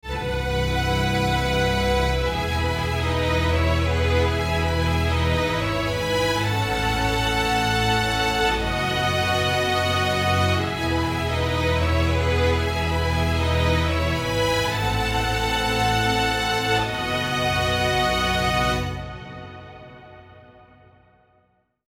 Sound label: Strings; Valhalla